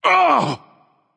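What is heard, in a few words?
nonverbal ouch; smite; voicepack; merlin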